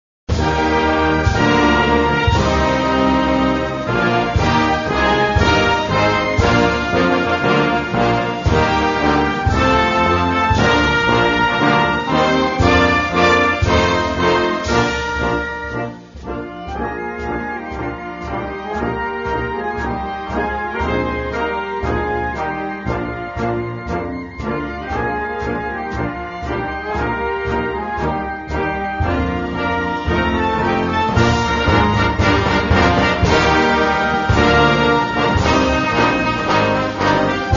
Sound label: Alternate Instrumental; Lavallee Calixa; Oh Canada